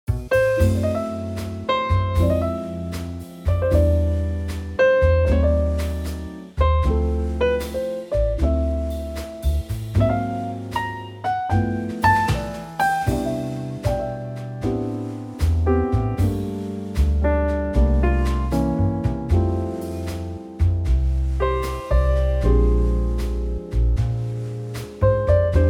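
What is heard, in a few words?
C trio, Backing Tracks for Male Vocals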